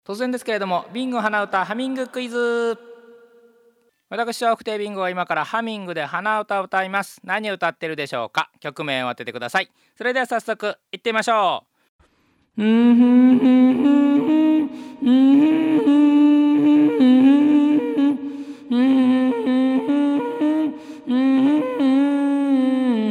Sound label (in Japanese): hanauta